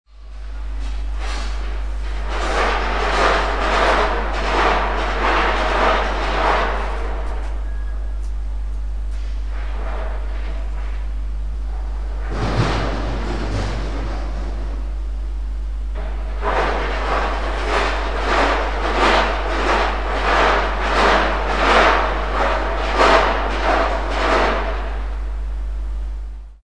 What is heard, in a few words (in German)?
laerm